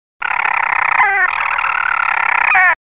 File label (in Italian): Uccello delle tempeste, uccelli europa